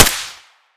mp5sd fire; weapons

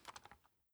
cloth, weapons; mas scar; cw20 extras

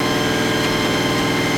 tank gunner move

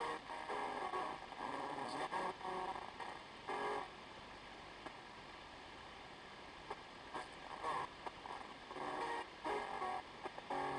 tv; easter

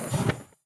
magma lurk flame end; npc

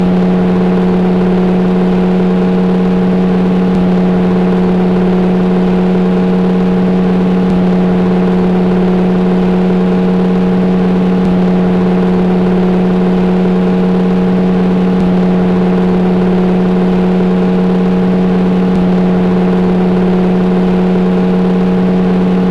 carrera gt, vehicles

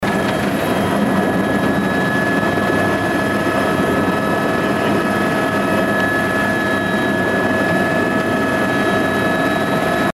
heli loop int; helicoptervehicle

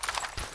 holster

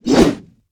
swing scythe attack; queen girl

